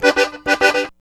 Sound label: Accordion Rhythm 106 G#; Zero-G Kocktail Kollection; Accordion Lix